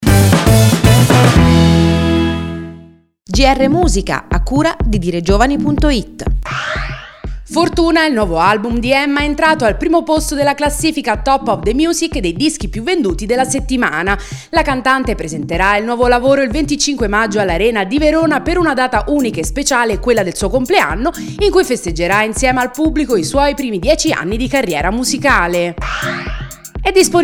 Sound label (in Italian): musica